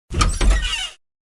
door of cabinet

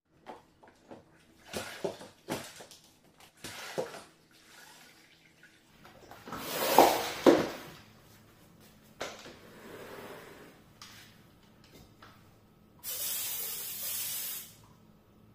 Mop

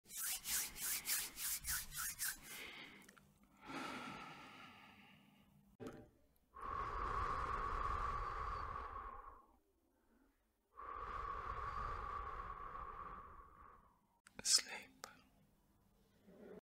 rubbing hands

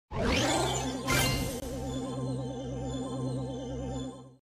Teleport

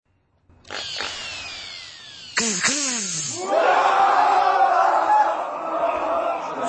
Ronix drill

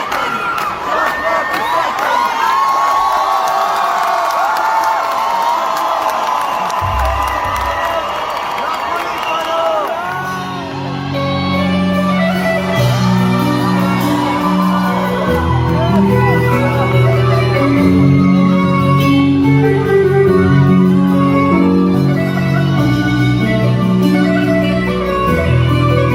violin

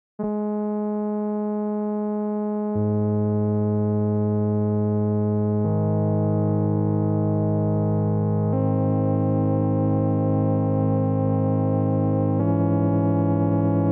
Organ

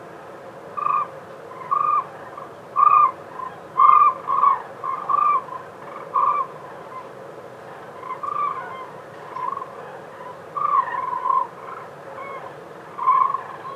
Common Crane

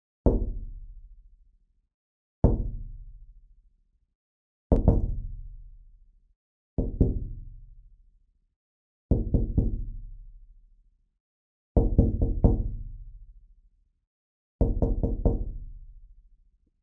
knock on window

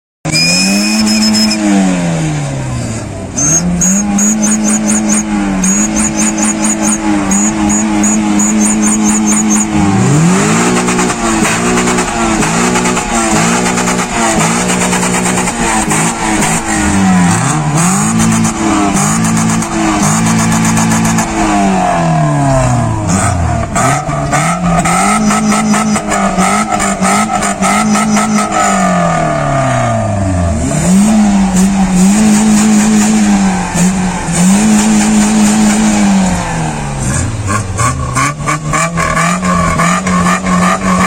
Exhaust whistle

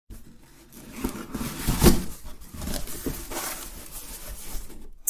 Cardboard box

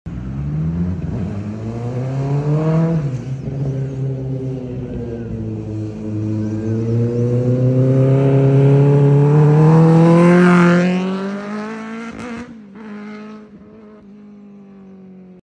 kawasaki ninja zx

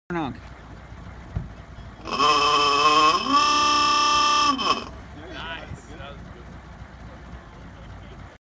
fiam cadillac horn

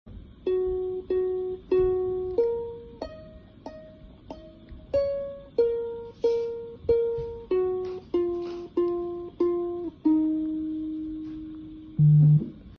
Ukulele

